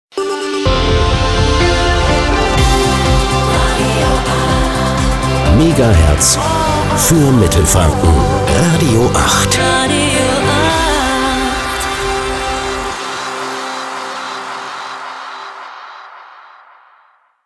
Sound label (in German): Transition Fast Dance to medium aktuell; Radio 8